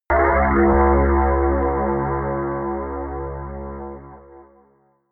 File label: synth hit muffled drop; whooshes rises